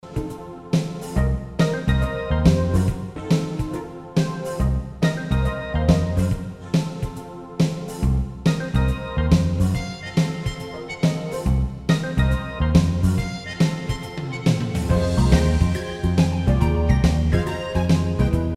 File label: detective spoof 70bpm